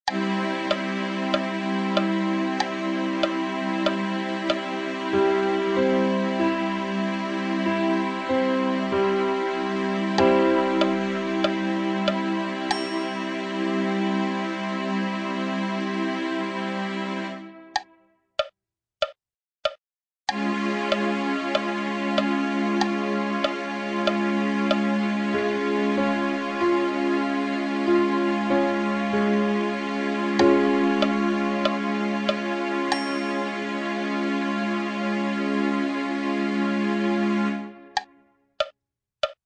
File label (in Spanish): mayor inv